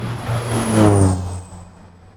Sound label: airport